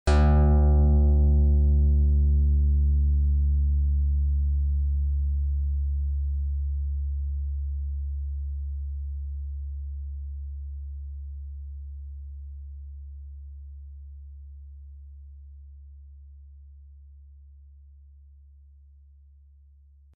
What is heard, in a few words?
lyre